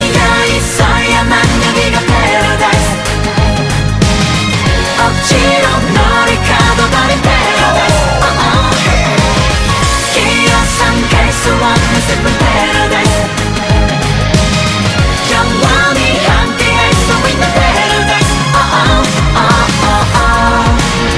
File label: chorus